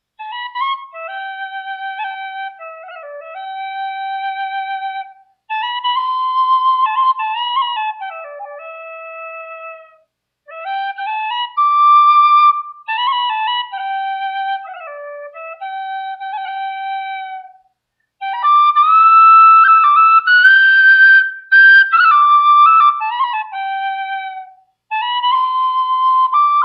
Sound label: hayrope